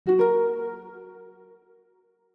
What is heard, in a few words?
connect